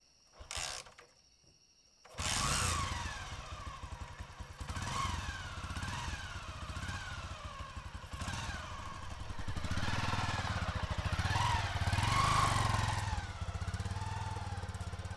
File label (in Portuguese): Moto dando partida e acelerando em ambiente rural com grilos; maquinas; canais-stereo; colecao sonora do cerrado